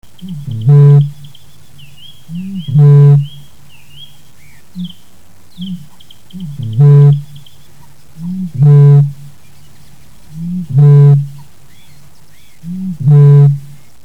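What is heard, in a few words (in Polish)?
bąk, bittern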